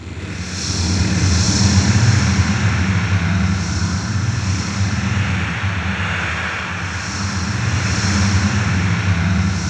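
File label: ATM HORROR, Production Effects; Best Service - XXL Production Effects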